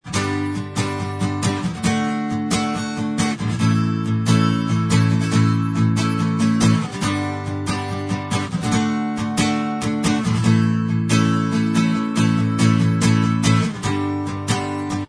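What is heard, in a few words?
acoustic, instrumental, backing track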